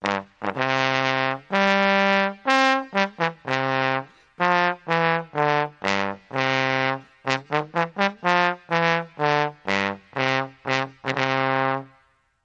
Trombone Pageant Bridge

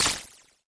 Thin Grit Spit Sweep

